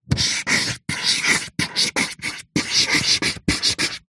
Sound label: office marker pen highlighter luminous write slow on paper